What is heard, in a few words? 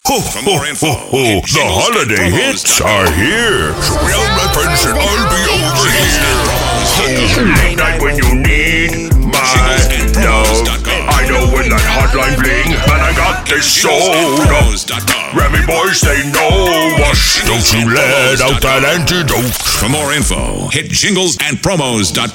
SANTA SING ALONG